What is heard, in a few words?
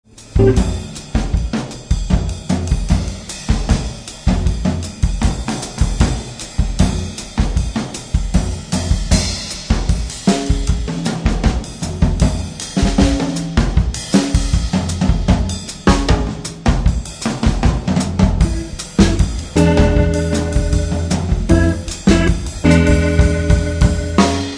drum